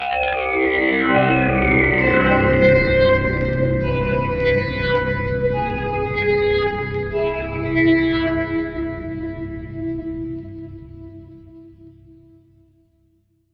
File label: MUS BOX ZING pad; eShop